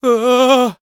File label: npc male scared; DeadFright